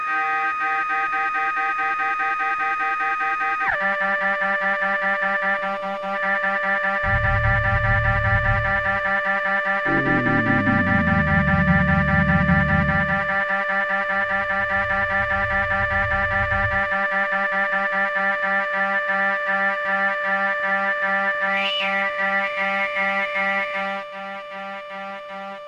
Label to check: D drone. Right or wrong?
Right